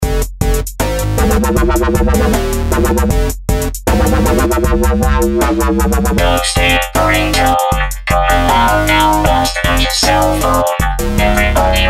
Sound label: Dubstep Ringtone